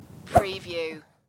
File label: Golf Air Swing